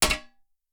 arrow metal, attack impact

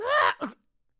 male death

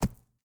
arrow dirt, attack impact

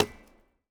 lumber tree

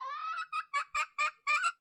chicken; fauna

